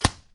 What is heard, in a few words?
arrow impact